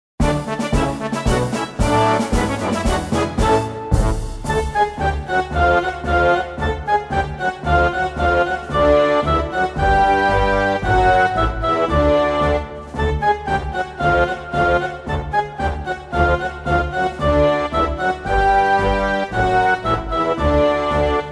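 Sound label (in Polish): instrumentalna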